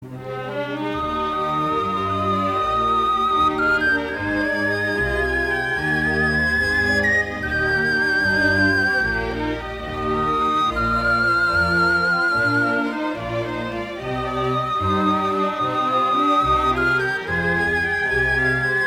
Training (Instrumental); Karate Kid

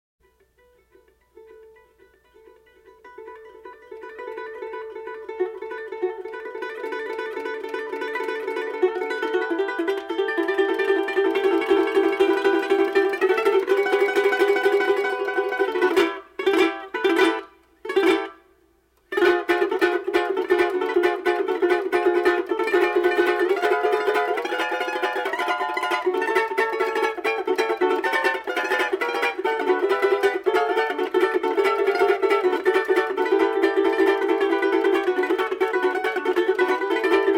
ukulele

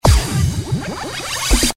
bass beat; booty tools 5

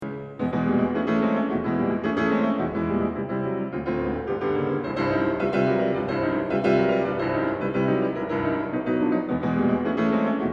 Symphonic Etudes, Piano; Schumann

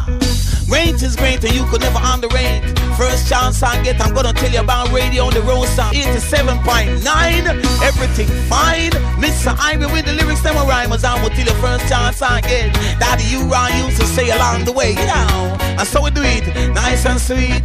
jingle

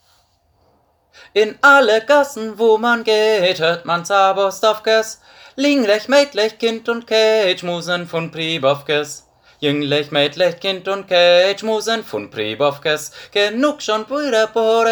bass

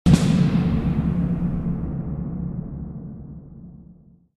Wooshe

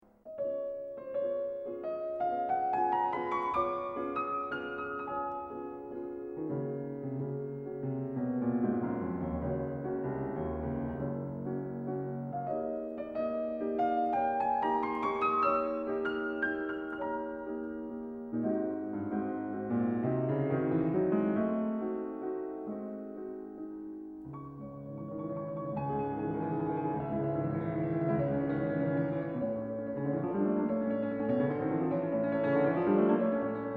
Symphonic Etudes, Piano; Schumann; Op. 13 - Var. 3 (Aus Dem Nachlass)

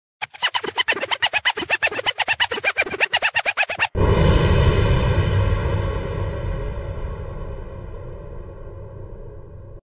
scratch+echo

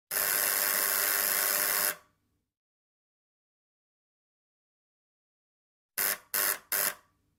intercom Buzzer Sound Effect

